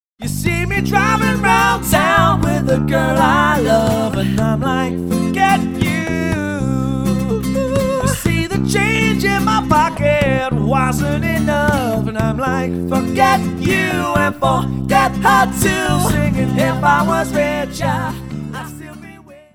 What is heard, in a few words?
Acoustic Duo LIVE